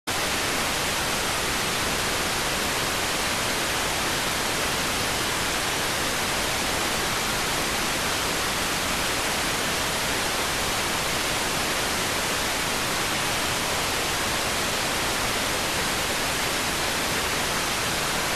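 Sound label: radioteletype receive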